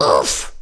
OOFF; 1997 MMX LAN PACK (win 95); Worms Armageddon; Danish-Pyrus

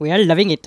laugh; Worms Armageddon; The Raj

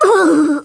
OOFF, Polish; 1997 MMX LAN PACK (win 95); Worms Armageddon

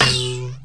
Holy Grenade Impact; 1997 MMX LAN PACK (win 95); WORMS 2

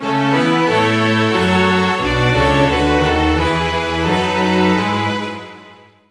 Malta, Fanfare; 1997 MMX LAN PACK (win 95); Worms Armageddon

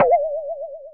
twang; 1997 MMX LAN PACK (win 95); WORMS 2

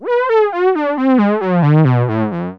Lowdown, Fanfare; 1997 MMX LAN PACK (win 95); Worms Armageddon

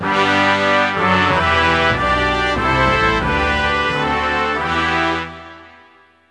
Georgia, Fanfare; 1997 MMX LAN PACK (win 95); Worms Armageddon

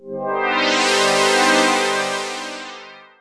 Fat synth, Fanfare; 1997 MMX LAN PACK (win 95); Worms Armageddon

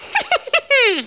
LAUGH; Worms Armageddon; Spanish

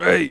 ooff; 1997 MMX LAN PACK (win 95); Worms Armageddon; Jock

